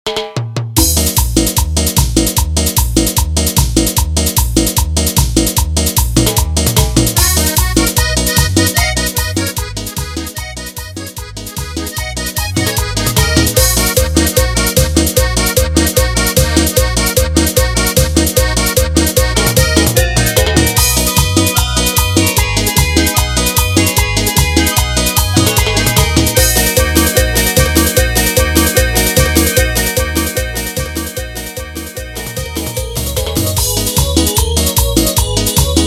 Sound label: Instrumental Carnaval Simple BPM 150